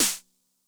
Med Tune High Snappy, 909 snare drum; classic machines; 909mod

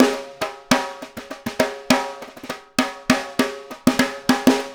Snare Pattern, Drums; Essential Percussion

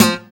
PULL, Acoustic; Sound & Vision; Gigapack I CD 2; GUI ACOUST.; GUI Acoustic 1

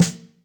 Snare M, Acoustic Snare

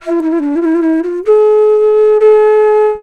FLUTE D, Indian Traditions; USB Soundscan vol.45; AKAI

